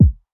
kick; eddyflux; crate bd; dough-samples

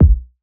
kick; eddyflux; crate bd; dough-samples

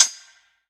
closed hh, crate; eddyflux

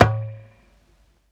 conga session perc; eddyflux

